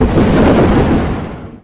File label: Thunder; TematicEffect